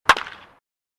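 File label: another bat hit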